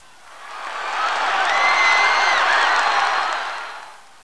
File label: Cheer; Intel Createshare; PC Camera Games; Shoop